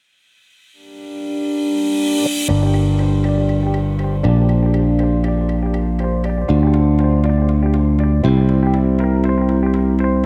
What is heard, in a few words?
Upbeat Corporate No Drums Mix; upbeat corporate uplifting motivational